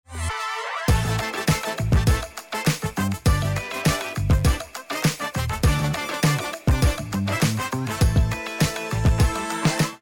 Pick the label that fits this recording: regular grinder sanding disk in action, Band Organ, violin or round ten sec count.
round ten sec count